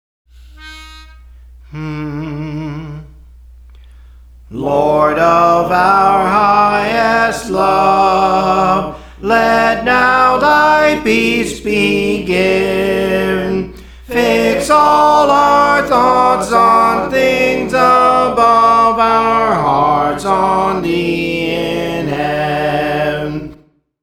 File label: Soprano; Praise For The Lord Training